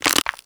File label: plastic bottle; oneshot; crushables; Half Life Alyx